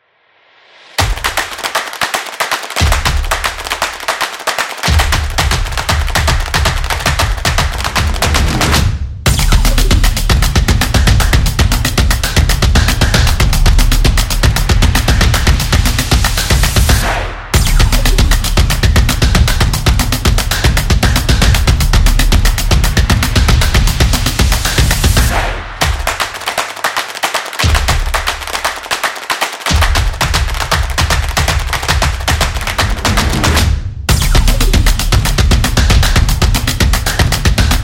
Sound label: Rhythm